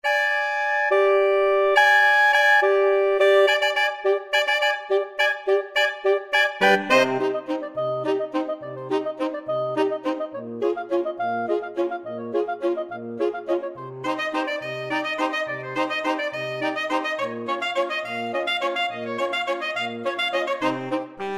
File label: saxophone ensemble; danse macabre